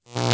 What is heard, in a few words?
spark; vendetta